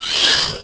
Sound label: roar; The Elder Scrolls III Morrowind GOTY; XBOX